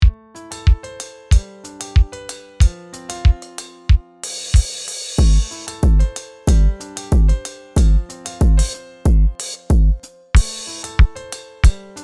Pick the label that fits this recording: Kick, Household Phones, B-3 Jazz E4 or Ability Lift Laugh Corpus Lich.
Kick